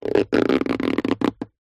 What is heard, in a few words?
foley rubber stretch; zapsplat